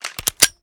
reload empty charge; reloads; xmag; gmod; pi mike

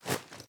reload drum mag in cloth; reloads; gmod; iw8

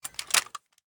wpn gbl plr inspect settle; viper

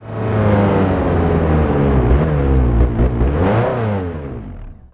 throttle off, vehicles; gmod; tdmcars; spark

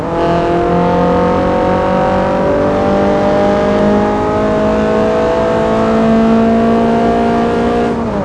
third, vehicles; gmod; tdmcars; focus svt

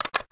ar reload mag in; weapons; gmod; viper; scharlie

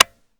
disconnector; weapons; gmod; viper

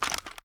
reload empty fast drop mag; weapons; gmod; viper

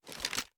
sm beta inspect; gmod

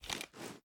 reload start, reloads; gmod

